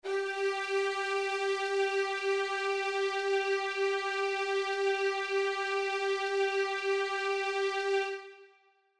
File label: strings normal; ロングトーン; ストリングス